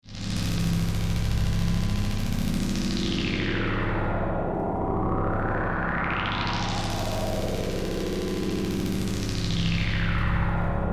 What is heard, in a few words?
Drone Synth Filter, Drone, Synth, Filter, Science Fiction; 5.1; BlastWave